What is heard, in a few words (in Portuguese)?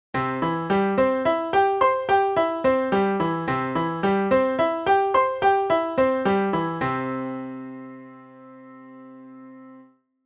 arpejo